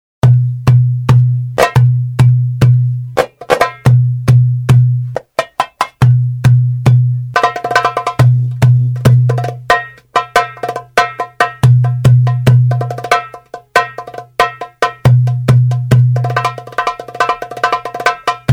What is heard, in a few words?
Tabla Al-Sharkey, oriental belly dance